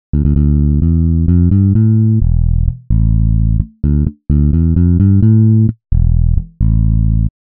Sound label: bass synthesis; fs